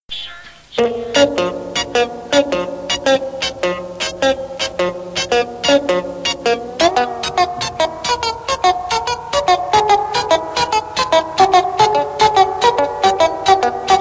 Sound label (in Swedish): trefvlig jingel